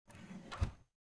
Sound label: cabinet door close